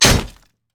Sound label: basicattack; pantheon